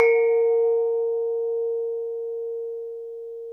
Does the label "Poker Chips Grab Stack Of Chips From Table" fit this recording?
No